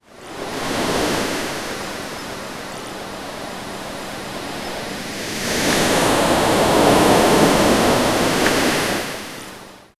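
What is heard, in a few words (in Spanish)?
olas del océano rompiendo, beach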